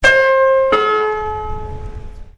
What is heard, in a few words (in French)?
Sonnerie gare